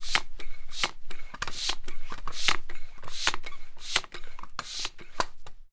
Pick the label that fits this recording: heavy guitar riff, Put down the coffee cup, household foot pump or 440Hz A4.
household foot pump